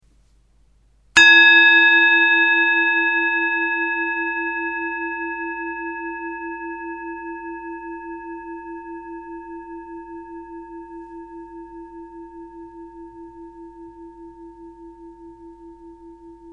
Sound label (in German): Klangschale